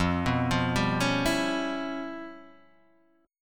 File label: C sharp 7th Sharp 9th Flat 5th, chord